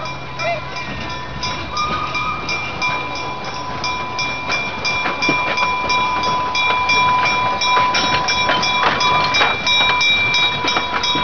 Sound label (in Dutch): bel; LaBretagne